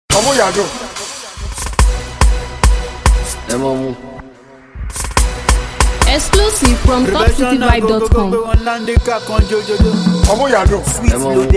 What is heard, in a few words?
Cruise Beat, Professional beat